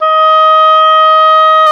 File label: OBOE, Orchestral Winds, Oboe mf f